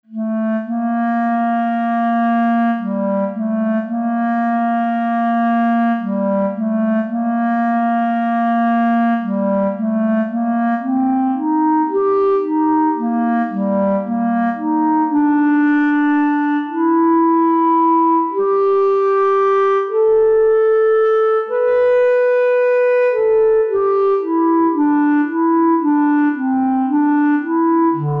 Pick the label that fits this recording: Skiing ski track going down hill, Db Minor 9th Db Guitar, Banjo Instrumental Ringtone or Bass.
Bass